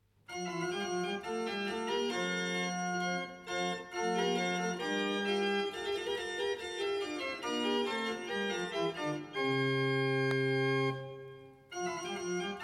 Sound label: Basse dance, organista; Pierre Attaingnant; Livio Vanoni